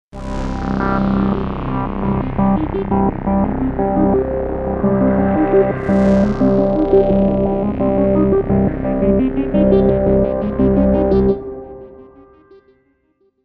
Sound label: Moog Voyager